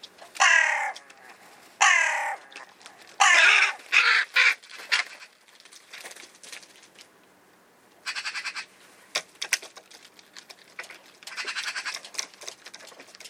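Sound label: hooded crow corvus cornix cornix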